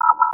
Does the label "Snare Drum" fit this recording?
No